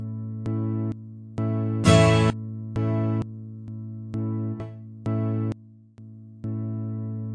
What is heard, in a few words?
surprising guitar blast; GUITAR LOOPS